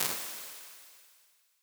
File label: Venue A, Impulse Responses, Outdoor Spaces; Medium Spaces; Apple